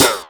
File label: HH OPEN, Fresh Disco House